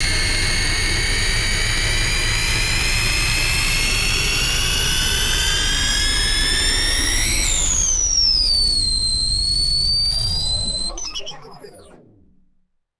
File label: futuristic generator winding down; machines